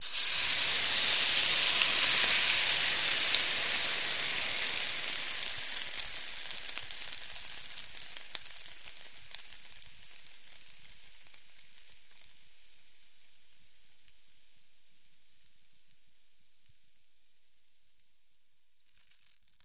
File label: Sizzle Cooking Fryi